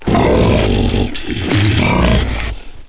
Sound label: bullsquid die; hl2